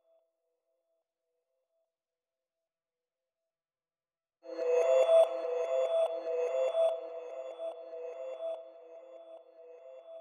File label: 145 BPM E Min Bell Reverse, Drill; Melody; Loop Stems; Cymatics; 2022 Melody Collection; Flows